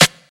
perfect snare sound e key, Loudest frequency: 3548Hz